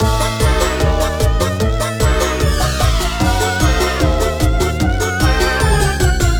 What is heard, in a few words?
bgm; truffle skill